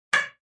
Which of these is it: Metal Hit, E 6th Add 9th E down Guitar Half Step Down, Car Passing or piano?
Metal Hit